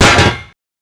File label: CLANK